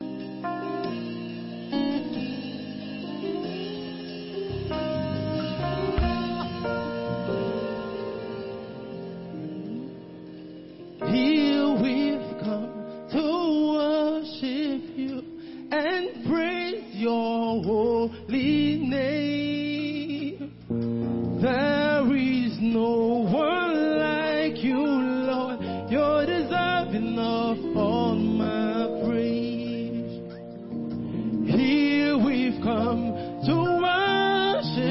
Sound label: CHOIR MINISTRATION FOR IGBO; JUNE CAMP 2018